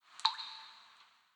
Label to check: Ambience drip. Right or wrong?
Right